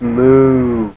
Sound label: Moo; Mr. Moo's Math